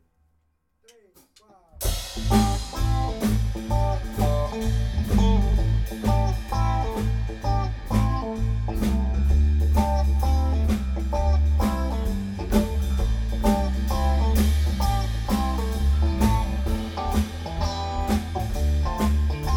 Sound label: Blues